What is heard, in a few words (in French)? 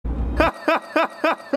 rire drole